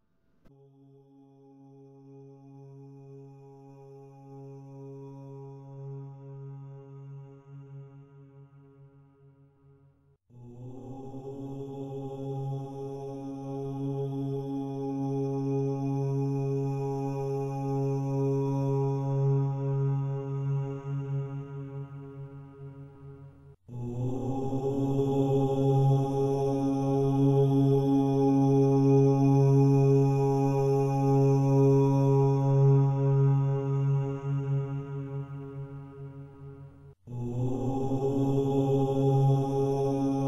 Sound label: Sitar music for sleep, meditation (OM F12); 20m; binaural; hybrid; Binaural Hybrids